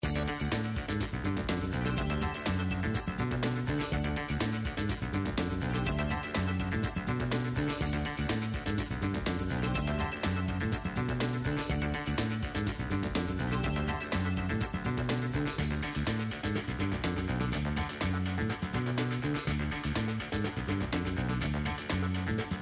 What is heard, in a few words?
BGM Free Spin; slots; Steam Miners